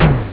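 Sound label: bass drum; dragon; Amiga Soundtracker Sample Packs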